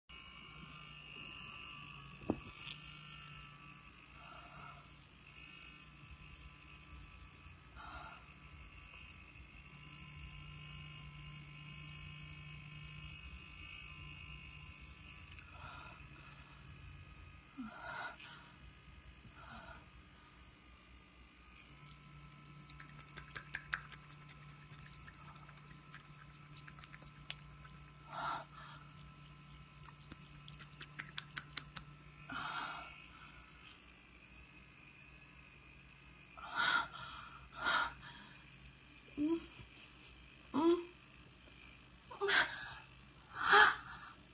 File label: moaning and squirting